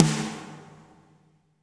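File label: reverb snare right; Spectrasonics; Burning Grooves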